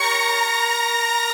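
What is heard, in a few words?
synth strings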